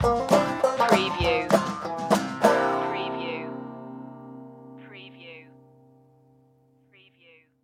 stomp stinger

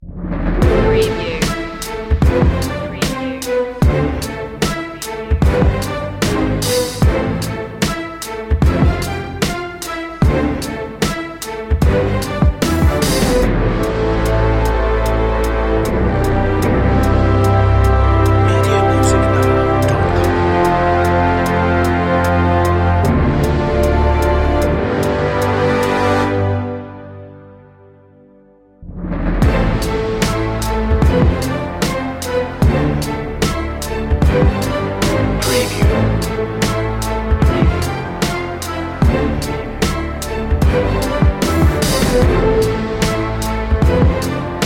battlesport no choir

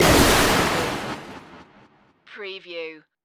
Magical Blast Airy

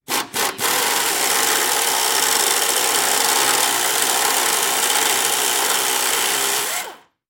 Air Gun Operate Long; sound effects bible

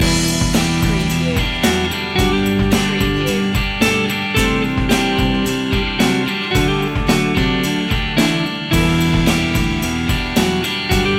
quiet determination loop

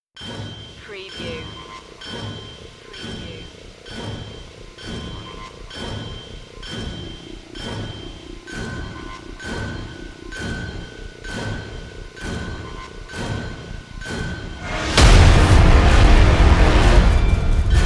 horror trailer second part only

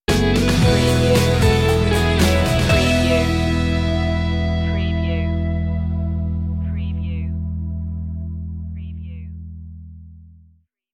easy summer stinger

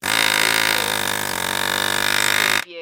Air Hammer Operate; sound effects bible

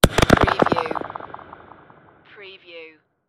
space cracker burst

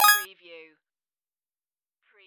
musical

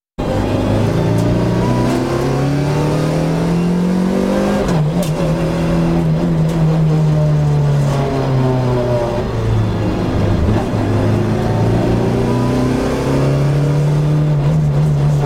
Rally car race excerpt; beanstalk audio

